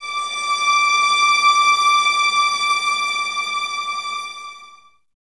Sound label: violas D 6, String Ensembles; Miroslav Vitous; VLS 1+2